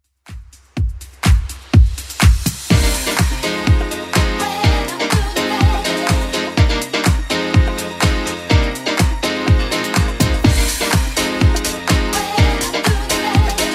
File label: 80s club 124 bpm clean; 80s Club Edit